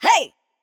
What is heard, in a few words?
Processed Chant, "Hey"; Cymatics; Euphoria Vocal Sample Pack